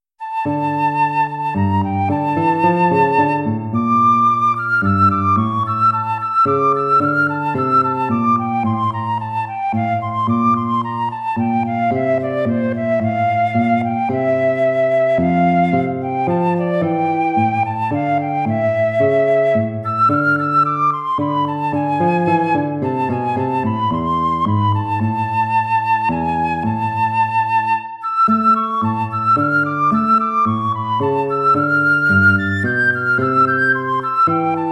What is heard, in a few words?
courante